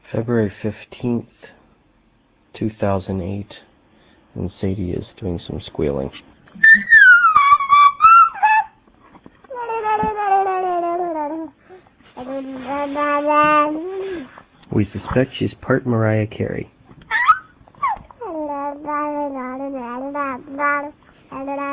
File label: squeal